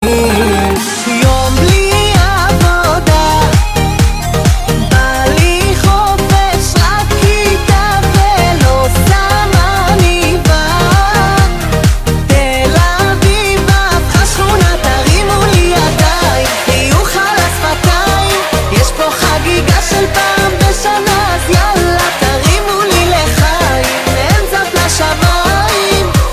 bass boosted

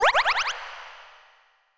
ration used; mgs

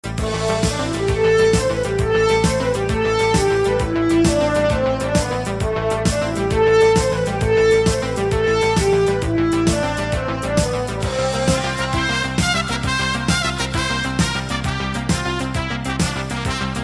viking horn

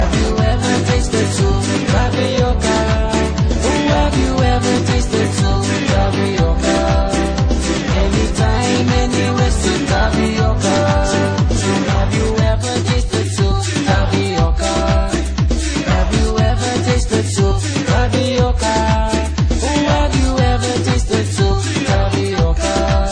Cooking Music

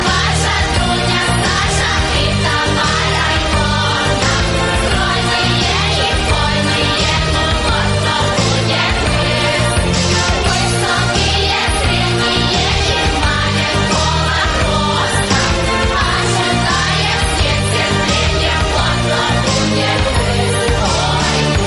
russian ring; reggae

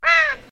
Censor

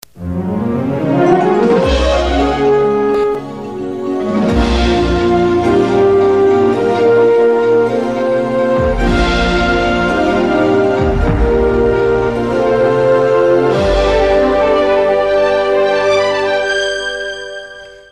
News opening; Hirado

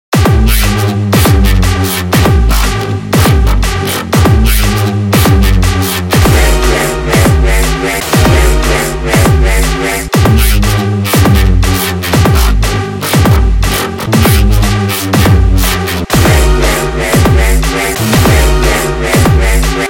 hardcore dub

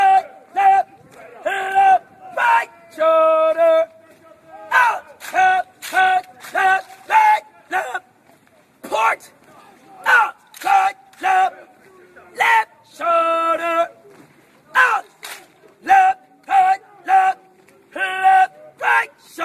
Armed Cadence; MCRD

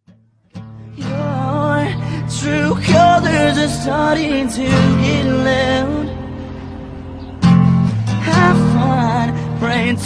acoustic, ringtones

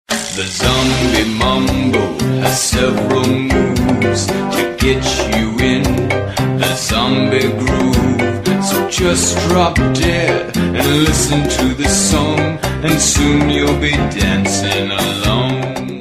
zombie mambo, ringtones children

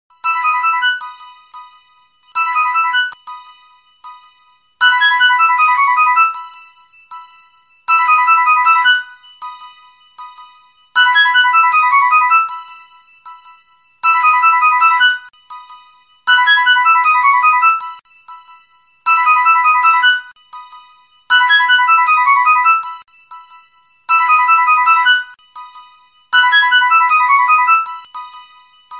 old clock

